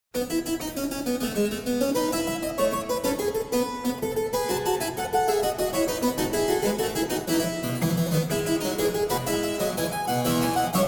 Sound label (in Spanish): Sonido de clave; Instrumentos de teclado